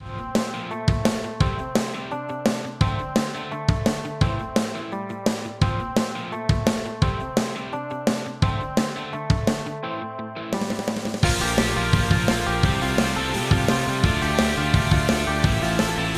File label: Rock, BPM171